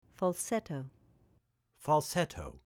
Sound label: Falsetto